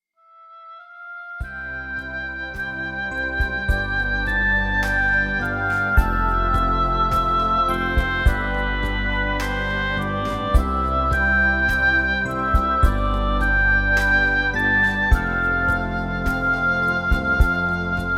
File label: sweet lullaby